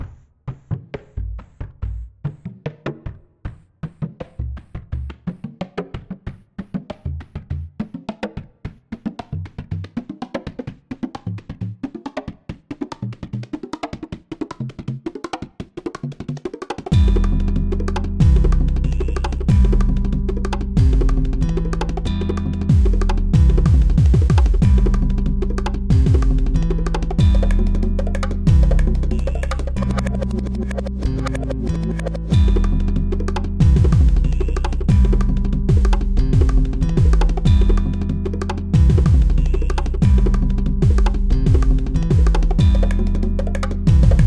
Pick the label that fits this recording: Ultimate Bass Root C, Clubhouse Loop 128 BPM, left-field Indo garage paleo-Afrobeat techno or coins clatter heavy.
left-field Indo garage paleo-Afrobeat techno